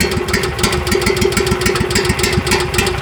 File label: generator sputter; ambient; machines; Counter Strike Model Pack; CS:GO